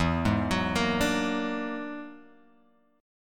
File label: E 9th Flat 5th E down Guitar Open G